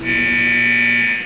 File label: outta time; thenetherlands